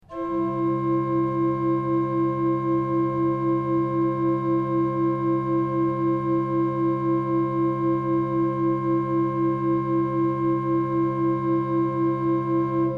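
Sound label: principal 8 + flautemaior 8, organ; srednja vas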